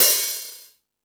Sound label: Ambient Rock, drum machine